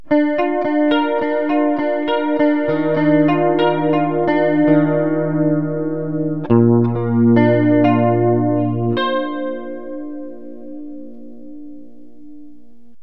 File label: chorus subtle